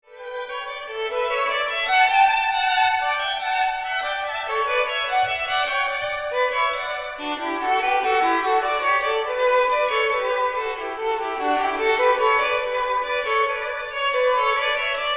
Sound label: violin